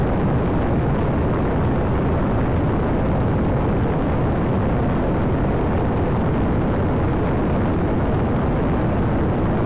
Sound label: elytra loop; Minecraft; create-astral